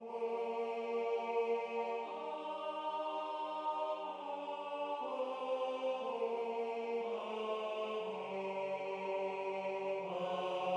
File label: Tenor